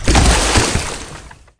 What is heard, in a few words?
Kayakers Hit Sfx; Kelly Slater Pro Surfer